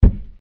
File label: trapdoor; hyperrogue